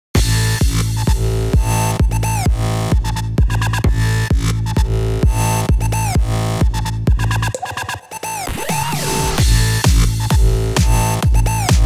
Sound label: edm; pa 700